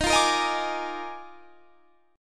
D sharp Major 7th Suspended 2nd Suspended 4th; D#M7sus2sus4; strummed

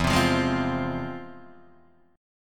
F Minor E; Fm/E strummed; JGuitar

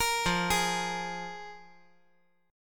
F Minor Double Flat 5th

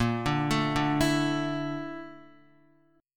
Bb Major Sharp 11th Bb down Guitar, chord; arpeggiated; JGuitar

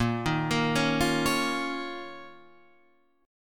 D sharp 7th Suspended 2nd A sharp

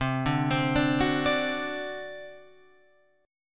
C Suspended 2nd Flat 5th C down Guitar Open F; Csus2b5 arpeggiated

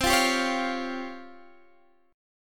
Db Major 7th Flat 5th C

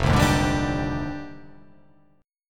F Minor 13th; Fm13 strummed; 1,3,1,1,2,2,4,4; JGuitar

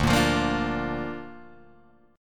D Minor Sharp 5th D Guitar Open G

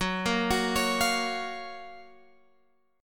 Gb Major 7th Gb Guitar Open G

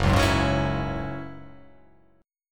F sharp 7th Suspended 2nd, down

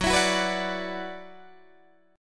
Gb 7th Suspended 4th Sharp 5th, Guitar DADGAD; chordshape; down

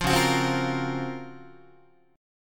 C sharp Major 13th, Guitar; C#M13 strummed; JGuitar

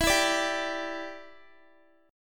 E Minor 7th, down, Guitar; Em7; strummed

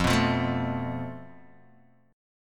F Major Flat 5th Guitar Open D, chord; down; chord shape

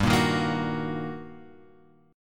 G Minor Major 7th Sharp 5th, G; GmM7#5 Chord {3 1 1 3 X 2} strummed

